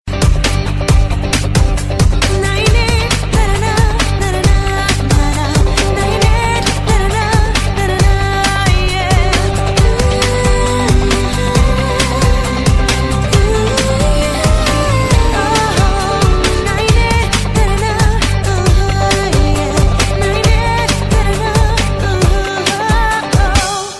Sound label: Beat